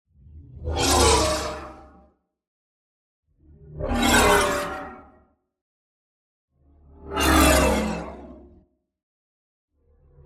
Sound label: WHOOSH SMALL Spectral Glide; boom library; Matter